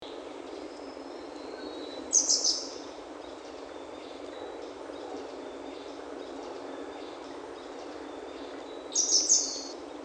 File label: Motacilla Cinerea; Grey Wagtail; Call